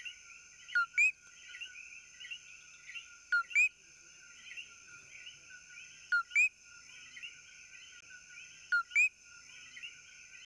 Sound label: coqui, frogs